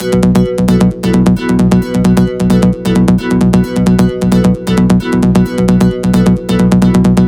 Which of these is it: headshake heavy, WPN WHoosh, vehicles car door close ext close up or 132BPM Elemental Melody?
132BPM Elemental Melody